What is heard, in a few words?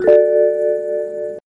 BMW Check Control